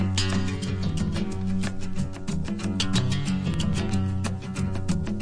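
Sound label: 092 funk electric guitar; SAMPLED MUSIC LOOPS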